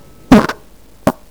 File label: fart